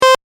Beep Simple Clean, A Simple Beep Without Additional Effects; Beeps (Sound Effects:Multimedia)